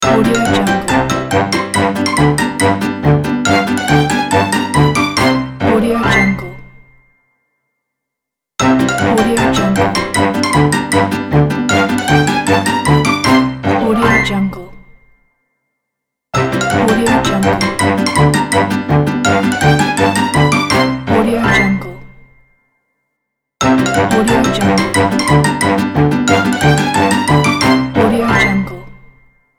cartoon logo animation ident

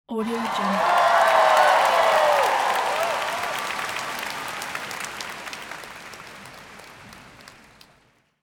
crowd cheers

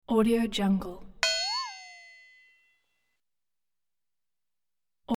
thin metal ping and warble

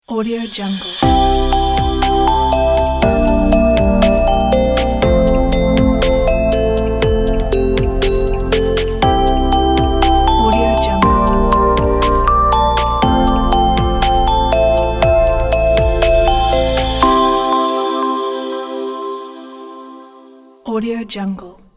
beautiful logo

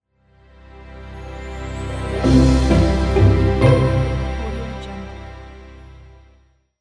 orchestral logo; tatreal

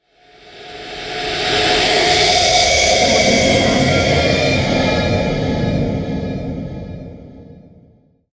bloody nightmare curses; soundmorph

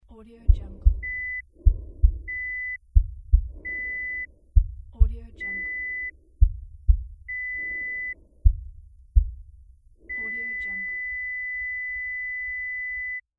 cardiac arrest cardiomonitor breath

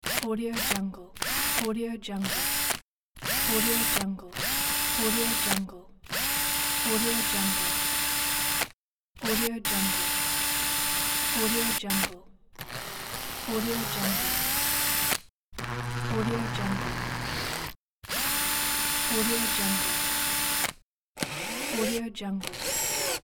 screw gun

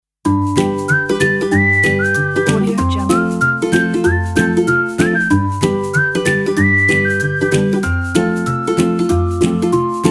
for happy

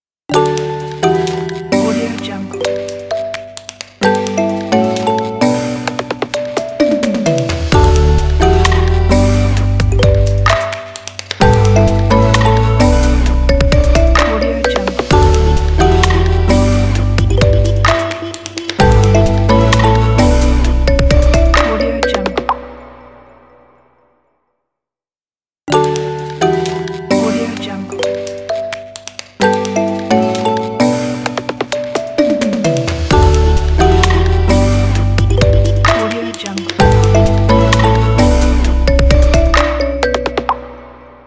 positive glitchy logo

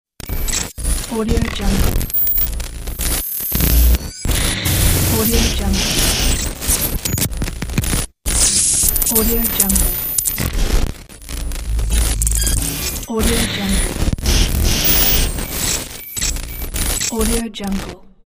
glitch; hasi007

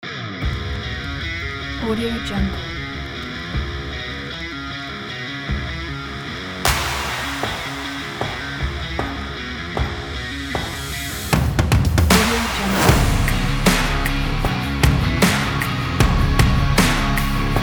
southern rock powerful trailer

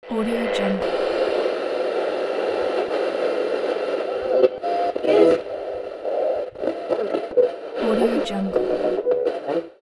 radio tuning; dedalsounds

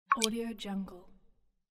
water drop

